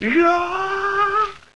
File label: Alert Msg